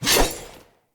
Saw Dagger Throw; Weapons; Player; Swords

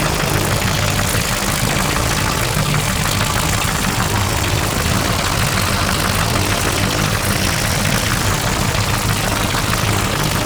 Experiment Pistol Beam Loop, Weapons; Warframe; Corpus; Sent Experimental Pistol

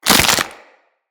Stealth Neck Snap; Tenno

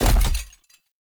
Step; Warframe; Grendel; Grendel Deluxe

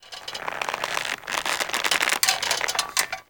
Rope Creak, Carnival; Warframe; Ambience; Grineer

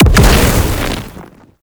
Bow Projectile Fire Explosion; Ultimate Abilities; Madurai; Warframe; Focus

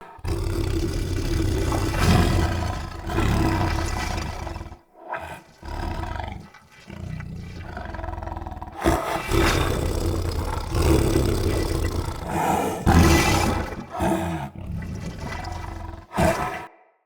Corrupted Battle Dog Breathe Snarl Walk Loop

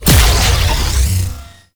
Larva Cast; Warframe; Infested Lich; Abilities; Zeke

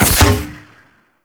Heavy Grenade Launcher Thunk; Warframe; Archwing

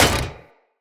Short Sword Medium Impact Dmg Metal

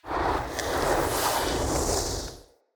Staff Caster Charge Heavy; Weapons; Player; Staves; Starter

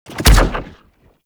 Infested Lich Virulence Impact; Warframe; Abilities; Zeke

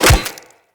Melee Staff Impact Flesh

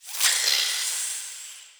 Interior Hiss, Infested, Ambience; Misc Indoor

